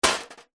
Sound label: stone drop onto small loose metal panel; foley; zapsplat